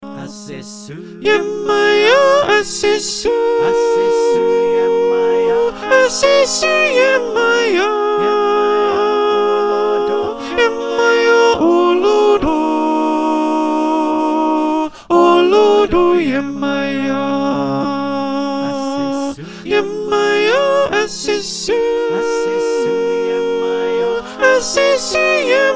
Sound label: High Soprano line